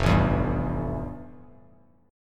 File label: F Major 9th F; FM9 strummed; JGuitar